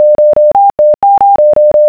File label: afsk